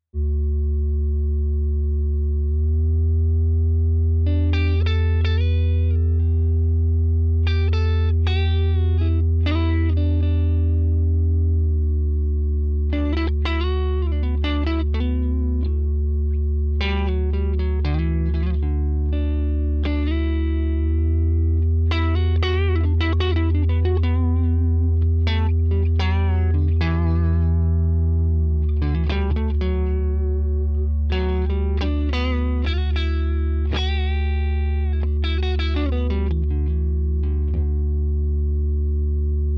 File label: 3 Note Generator